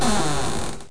Squeeking door; Curse of Dragor Demo

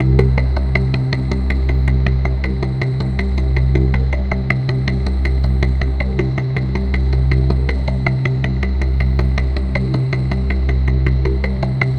AMBNT 160; eJay; Ambient

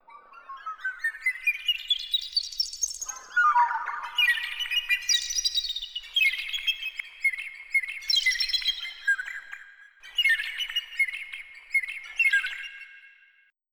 EAGLE; croc

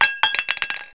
smal bolt, nutsnds; Wired for Sound Pro CD; Aristosoft, Inc.

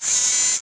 glop; Pinball Science

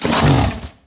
salamander hit; DAoC

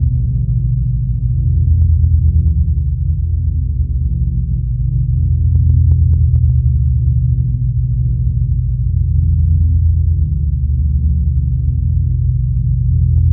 Large Drone; Earth and Beyond; EA Games

